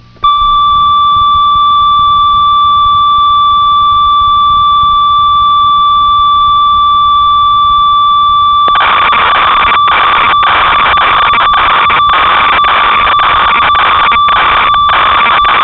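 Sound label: ob6 bass, synth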